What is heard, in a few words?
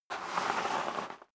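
step boots gravel light slide long, footsteps; schritte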